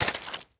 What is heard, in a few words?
meat, player steps; quake2